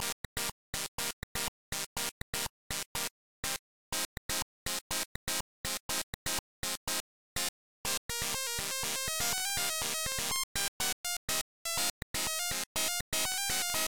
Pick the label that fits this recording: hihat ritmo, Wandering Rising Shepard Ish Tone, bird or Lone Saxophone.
Lone Saxophone